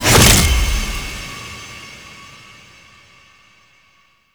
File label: Sword Slice Hand; Cinematics; Ballas; Warframe; TheNewWar; Throne Room